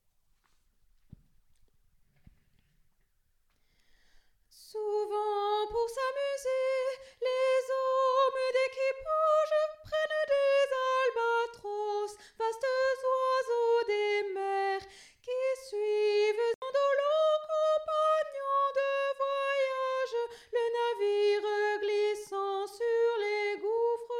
l albatros tenor

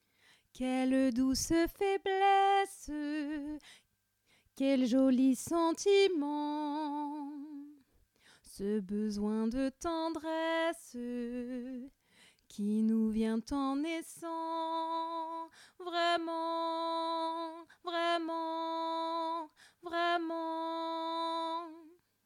suite alto

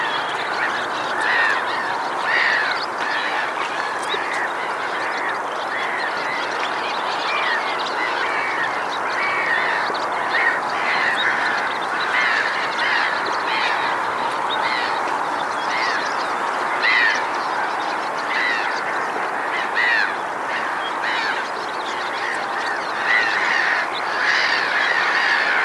hirondelles rustiques

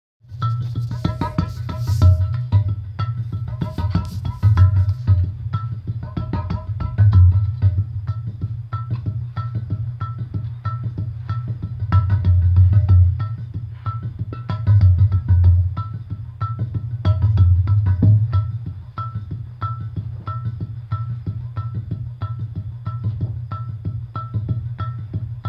joue du pot

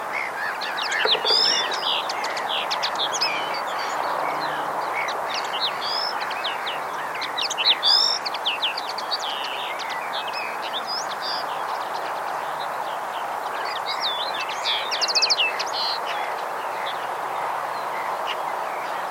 linotte mélodieuse étang

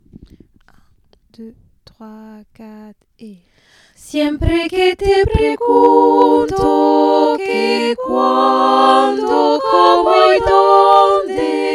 tutti

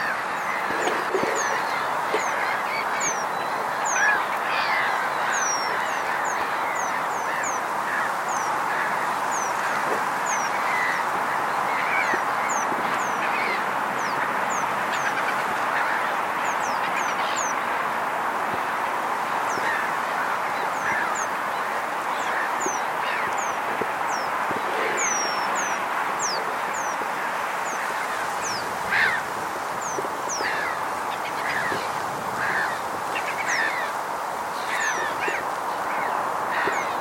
remiz penduline etang

